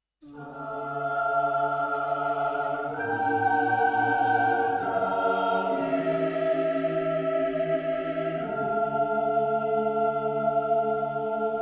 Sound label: Choral Music a Cappella; Napoleon Total War original soundtrack 2010